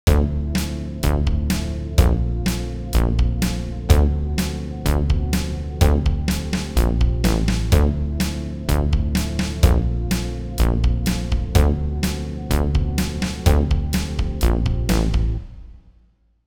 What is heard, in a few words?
Menu Song; Duke Nukem II